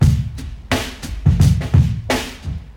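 87 bpm drum groove d key, kick tuned to the D note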